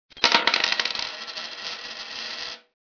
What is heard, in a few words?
Coin drops